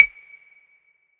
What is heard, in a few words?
WoodBlock; Percs